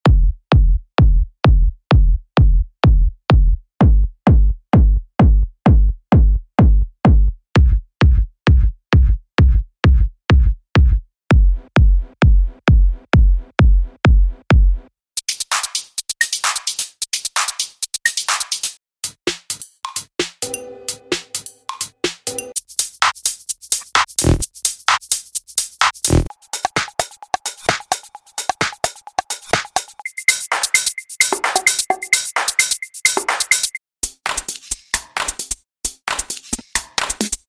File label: techno